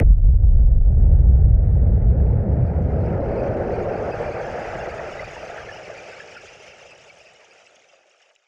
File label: Noise Bomb Boom Filter; musicradar; bomb factory